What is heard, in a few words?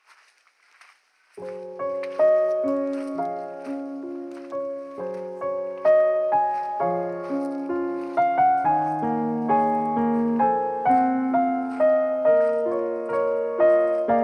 Intro Instrumental; Atelier Firis: The Alchemist and the Mysterious Journey; vocal album